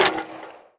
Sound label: last shot mech, guns tanks